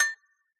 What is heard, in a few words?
agogo High, 505; Tone.js